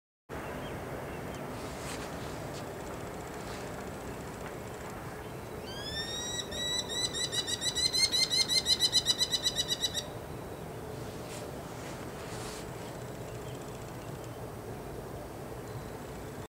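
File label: Merlin, bird; ترمتای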